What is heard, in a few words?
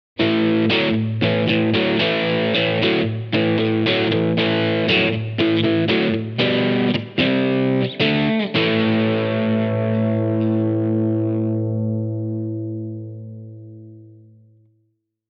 Gain A